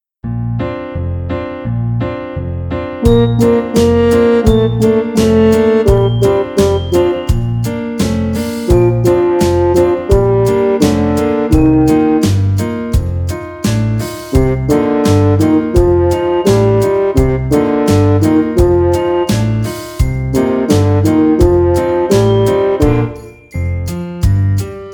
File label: horn; easy beat vol 1 horn